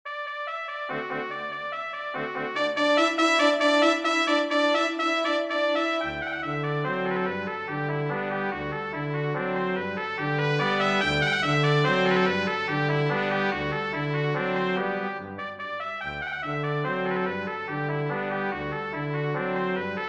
for brass quartet; burgma ller johann friedrich franz; the shepherd 039 return from tudes

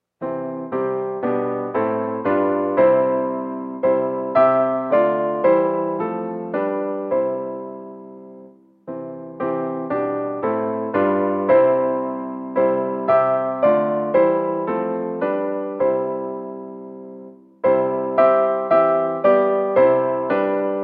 chorale; melchior teschner; hymnes et cantiques n 103

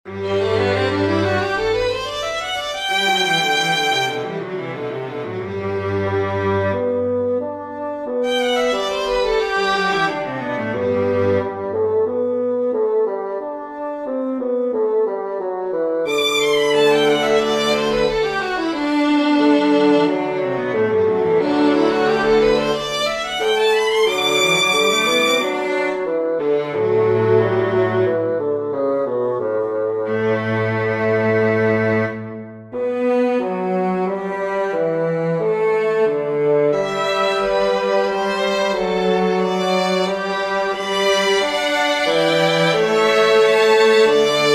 aria for bassoon strings; haendel georg friedrich; sicut sagittae from nisi dominus

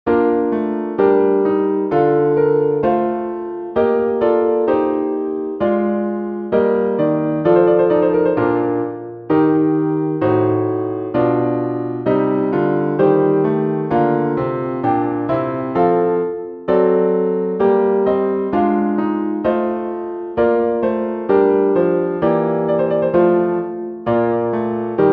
chorale for piano; bach johann sebastian; mir hat die welt tra glich gericht

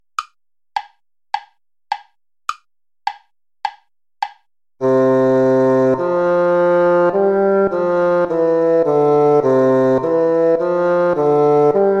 vivace play along

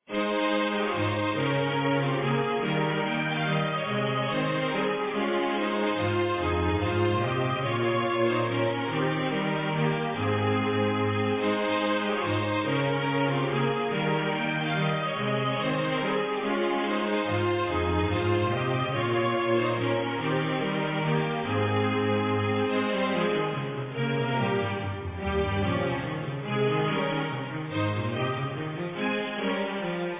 menuet

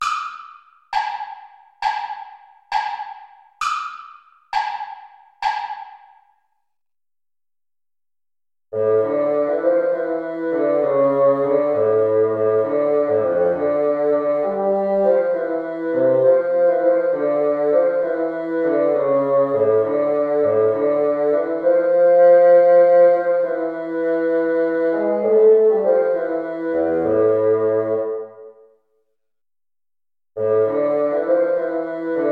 siciliana play along

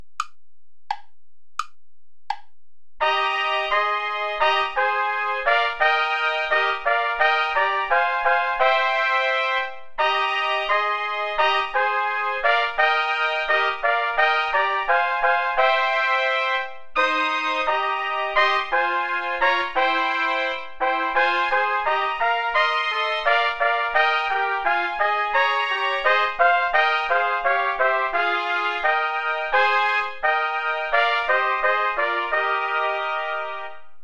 play along without trumpet; schutz heinrich; ich will solang ich lebe

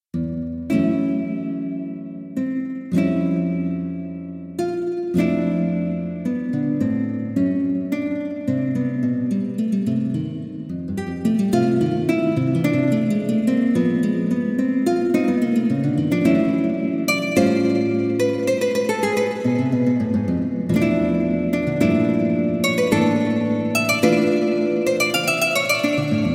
thoughts for guitar

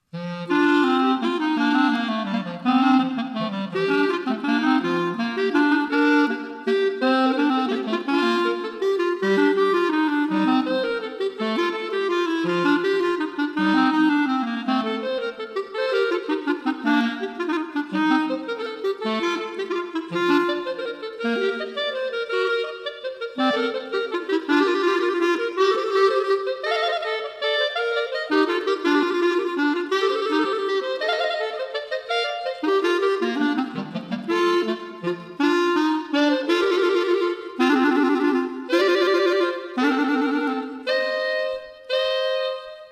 duetto for clarinetto

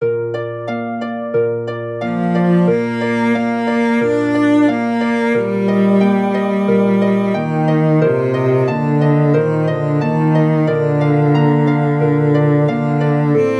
recitative for cello harp; Johann Sebastian Bach; mein wandel auf der welt

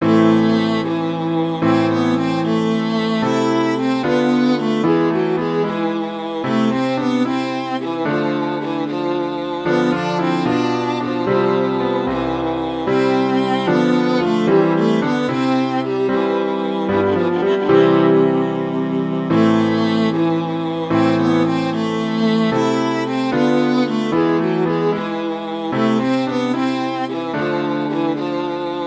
for viola piano

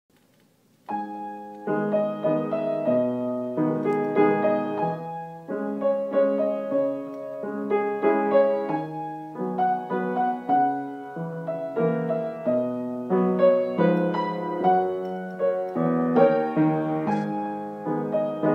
waltz; brahms johannes

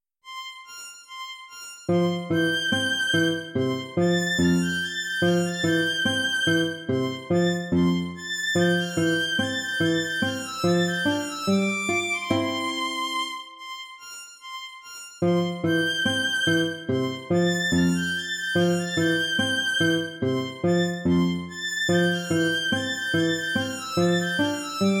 gavotte; corelli arcangelo

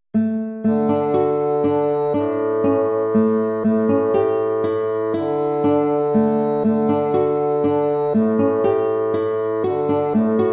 waltz; Carulli Ferdinando

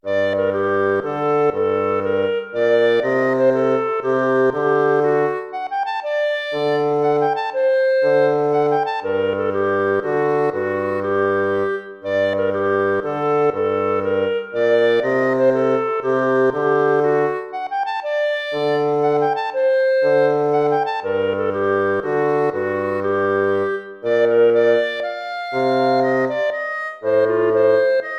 baroque minuet minor

